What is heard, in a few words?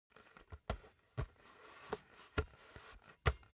basketball handling